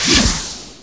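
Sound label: light saber; duke nukem total meltdown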